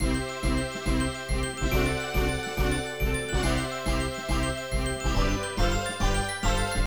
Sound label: Ui Star Chest Open; Cookie Run: OvenBreak